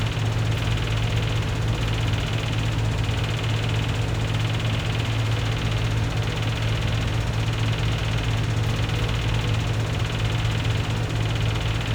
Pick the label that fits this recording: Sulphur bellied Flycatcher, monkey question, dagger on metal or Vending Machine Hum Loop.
Vending Machine Hum Loop